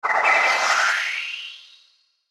Warble Delay Sweeper; Warped; Blastwave

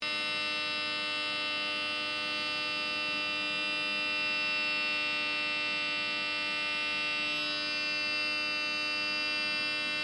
ELECTRONIC BUZZ LOOP; Various machines; Electrical Buttons; Multimedia

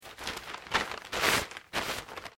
PAPER BAG LARGE SMOOTHED; Material components for constructing more complex sounds; Paper (Sound Effects:Materials)

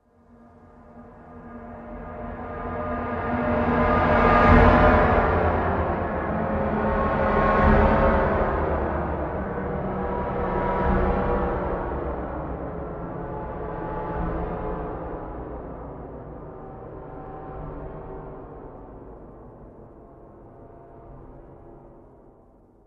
Falling Into Fear Transition

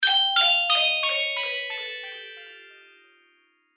Fallen Magic

